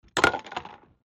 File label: wood material